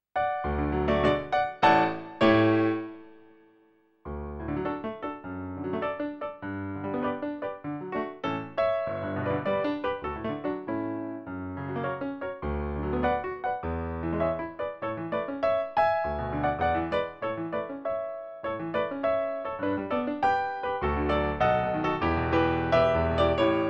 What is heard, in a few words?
piano; Zigeunerlieder 5; Brahms